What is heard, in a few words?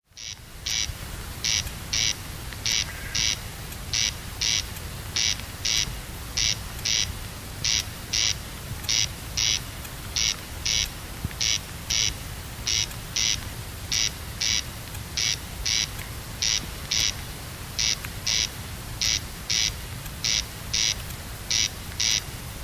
corncrake at Leurbost, North Lochs